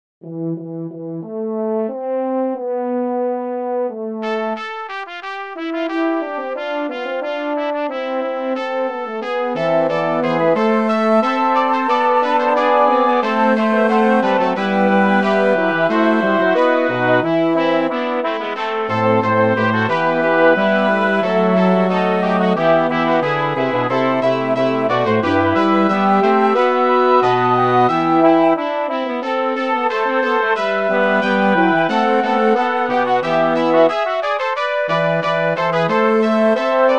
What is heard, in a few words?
chorus for wind ensemble; bach johann sebastian; uns ist ein kind geboren